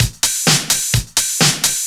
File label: Straight Loop 128 BPM, House